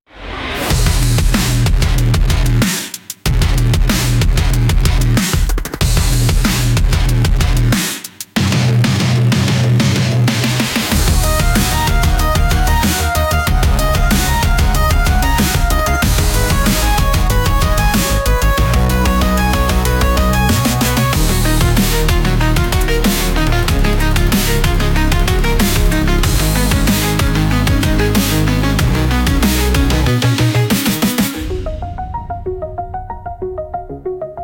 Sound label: 94 bpm Fadeburst Industrial Drum Noise; 51 sec; ai social media music